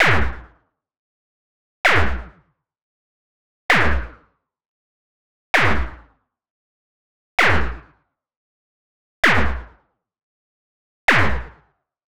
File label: Superkick Fx